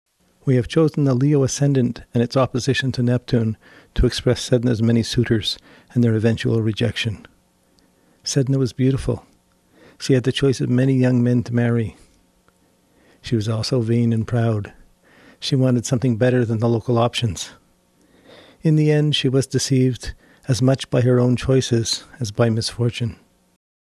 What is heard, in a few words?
Astrological Notes Many Suitors, harp